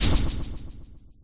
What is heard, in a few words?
highspeed movement